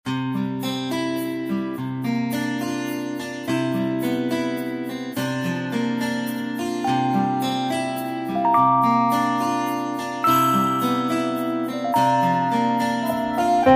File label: bgm farm; Kardia Fantasy Mamono Hime-tachi to Bouken Monogatari; android